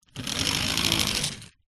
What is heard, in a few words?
leisure toys lego vehicle small roll wood rubber tyres